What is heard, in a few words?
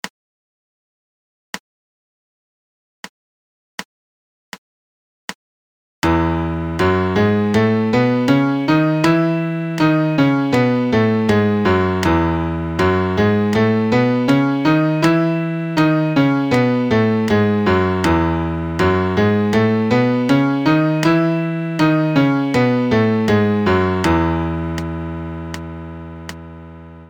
MIDI Major Scale E 80